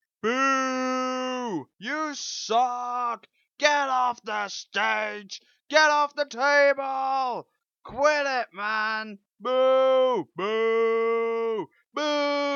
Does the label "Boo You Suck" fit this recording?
Yes